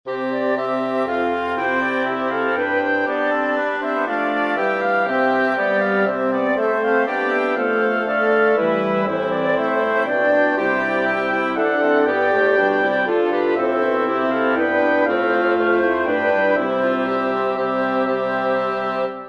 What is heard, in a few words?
intrada for wind sextet